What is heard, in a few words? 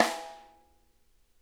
rim mf L, snare; Sonic Reality; Interactive Drum Kits